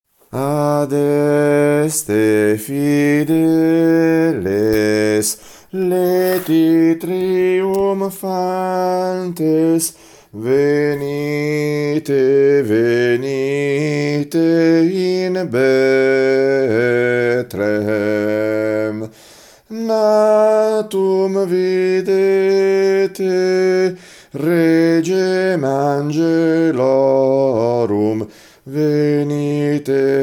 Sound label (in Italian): Adeste Fideles soprani